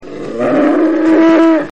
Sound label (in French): Taureau